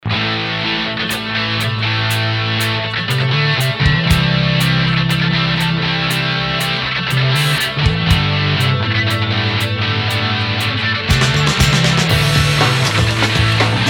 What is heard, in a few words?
rock guitar